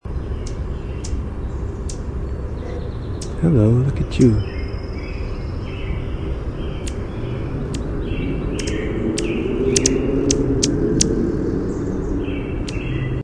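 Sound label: Anna's Hummingbird chip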